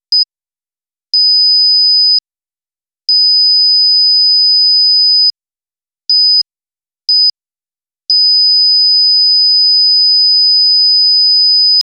a series of beeps followed by a loud buzzer

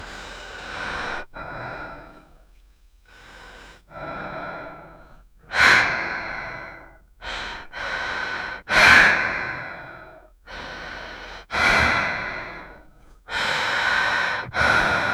obese heavy woman breathe

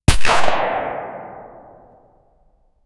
realistic gun shot

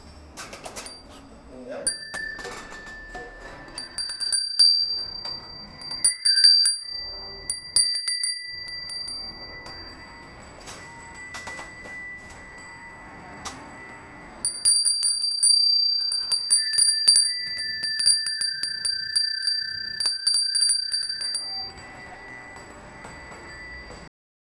magical santas workshop

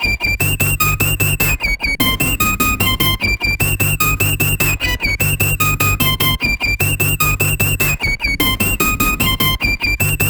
a weird oscillating dub

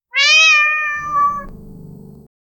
the meow sound of a

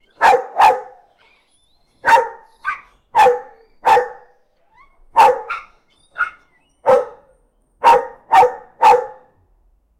angry german shepard dog barking

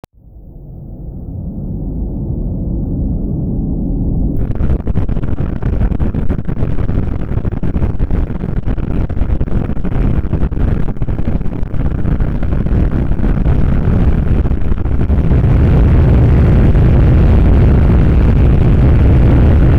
a rocket starts flying

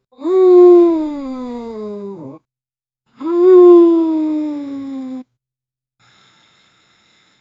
a long low moan of frustration

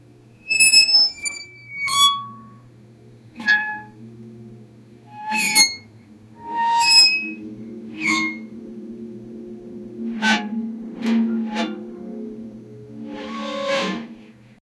animatronic moving squeak

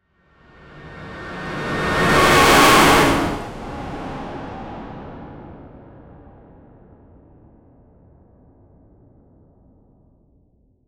whoosh cinematic

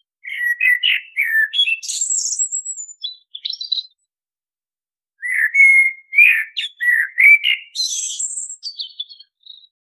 blackbird song summer dusk in forest

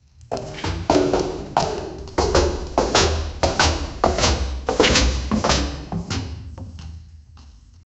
rapid footsteps running down a metal staircase

